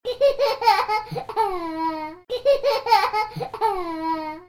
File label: Laugh